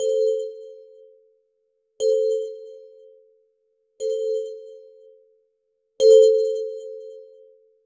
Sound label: a soft modern futuristic, user interface